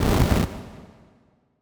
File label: spell fire; soundscrate